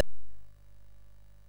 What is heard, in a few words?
sid MOS6581R3; hvsc